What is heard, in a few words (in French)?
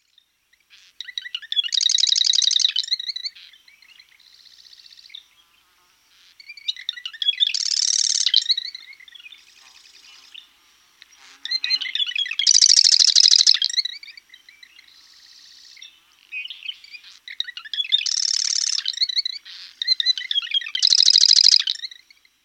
chant